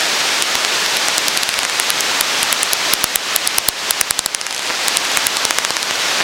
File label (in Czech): netopyr vodni, Myotis daubentonii